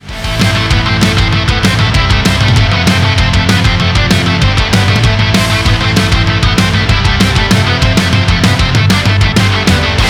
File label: ROCK WRITING SOLO